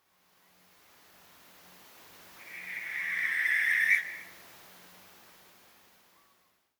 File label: Western Barn Owl Tyto alba; birds at night